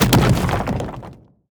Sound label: intro stand; wukong bdk